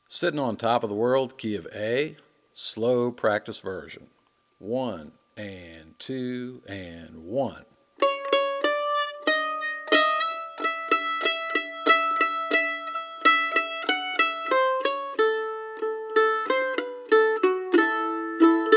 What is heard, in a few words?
Key Of A Practice Version